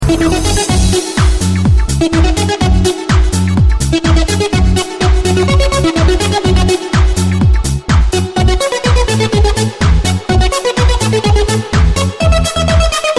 Instrumentalne Hindi; Nowe Mp3 Instrumentalne Hindi

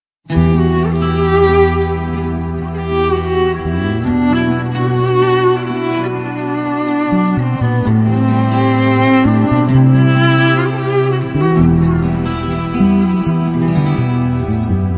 muzyka turecka, dzwonki; Piosenki

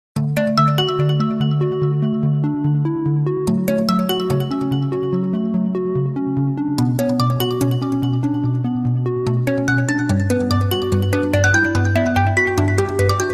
android samsung, dzwonki

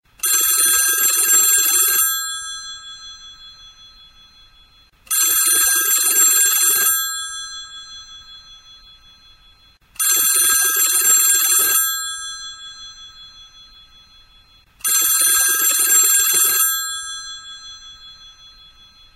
retro dzwonek telefonu

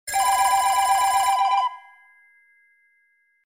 Stary Telefon Old

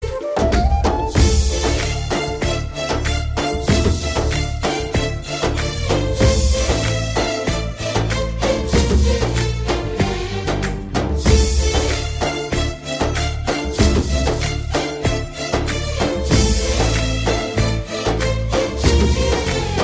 romantyczna muzyka

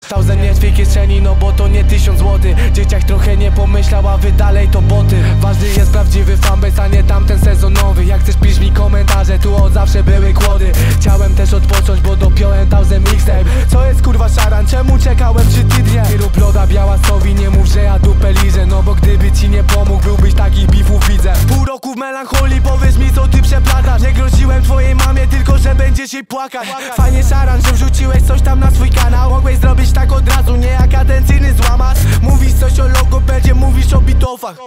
dzwonki na telefon, Rap

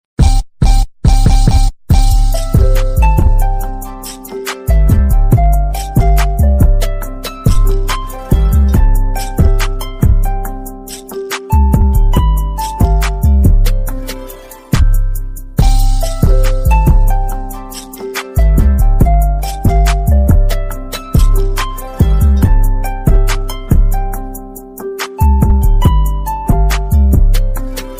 mem alarmowy samsung

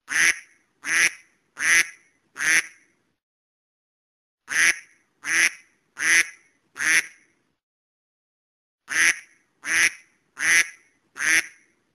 kaczka

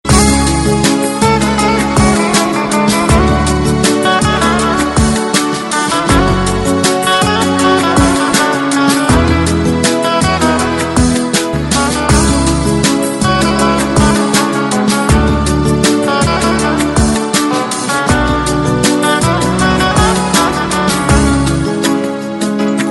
Gitara Smutku, Instrumentalny

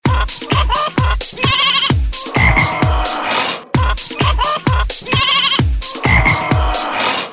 piosenka o zwierzetach

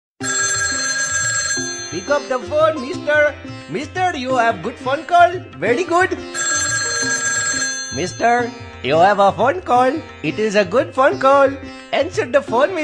zabawny smiech dziecka

